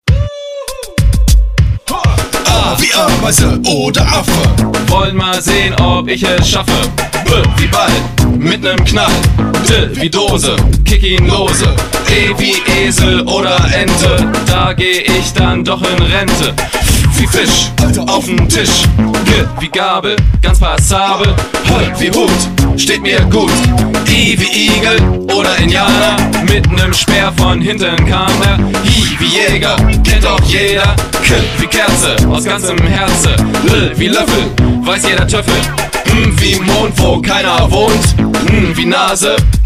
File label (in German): Anlaut Rap; Rechtschreibwerkstatt